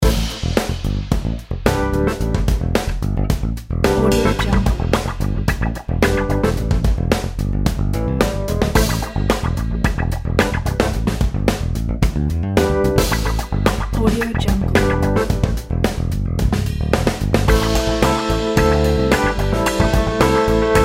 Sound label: funk groove soul